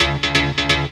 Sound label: Control Stab, 10B; Jungle; Blu Mar Ten - Jungle Jungle - 1989 to 1999 Samplepack